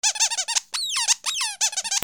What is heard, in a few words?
TOYS